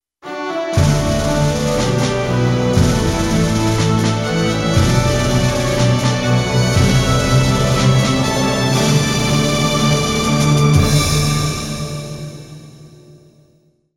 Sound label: victory; planetside